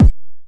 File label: Commodore 64 SID kick, 8bit SID Drums; LO FI and 8 BIT KITS